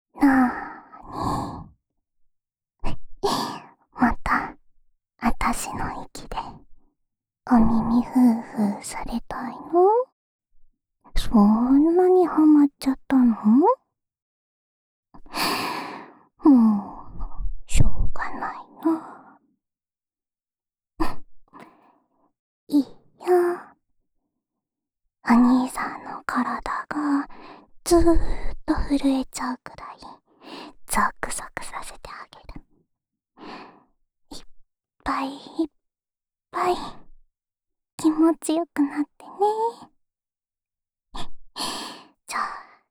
安眠用お耳ふーふー（左耳）, ASMR

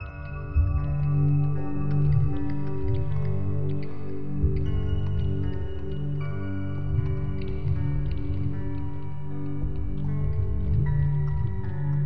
アクアリウム ユートピア BGM

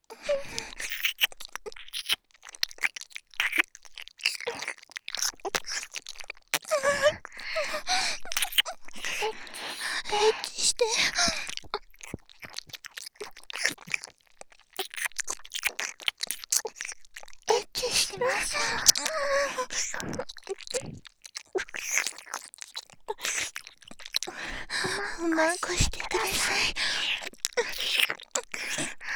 耳舐め喘ぎ; エッチしよ; ハーレムオナサポ 4人のメイドがご主人様のオナニーをお手伝いするバイノーラル～文、千曜、名月、莉桜～

